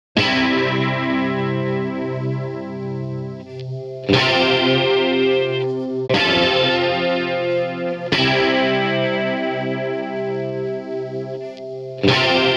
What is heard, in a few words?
Guit